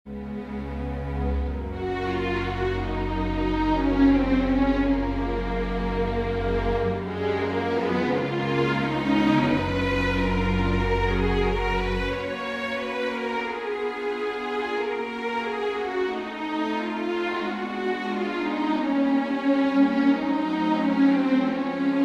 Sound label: Suite for Strings Intermezzo; III